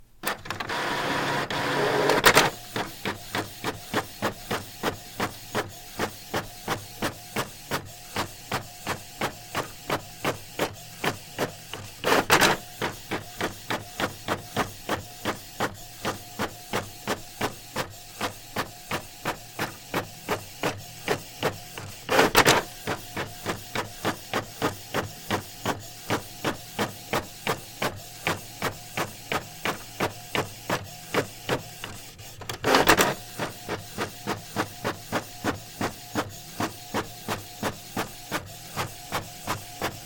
speed cont print; electric

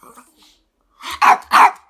maltese dog; animals

